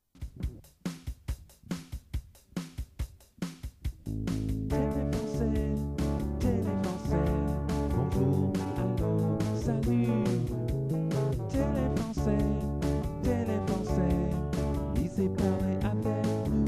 theme song; telefrancais

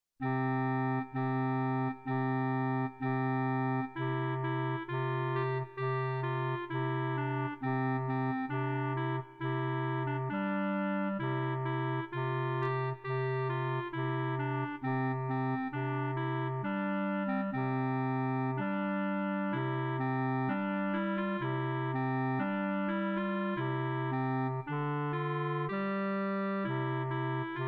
ode to joy clarinet; beethoven

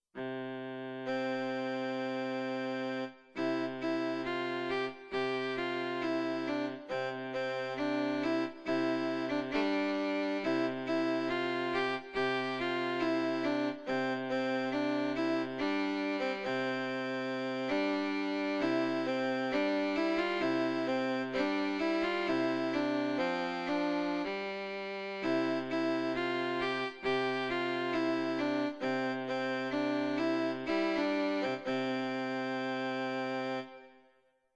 violin; beethoven; ode to joy

